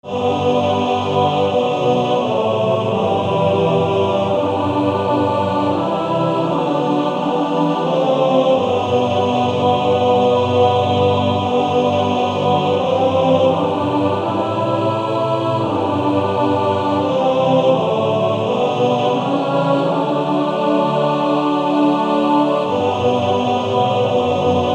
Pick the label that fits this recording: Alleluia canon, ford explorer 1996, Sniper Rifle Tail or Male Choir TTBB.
Male Choir TTBB